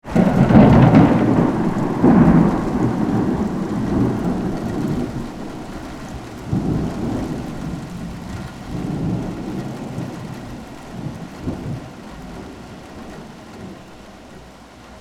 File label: thunder